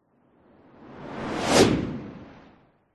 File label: sound design fast whoosh; zapsplat